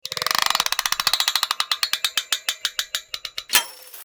slots rolling

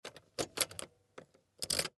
open lock, car

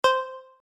final Signal

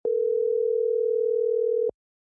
outgoing beeps, phone

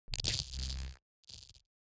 discharge, electrician

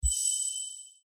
shooting target

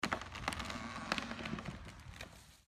stump fall, lumberjack

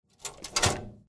starting, gas

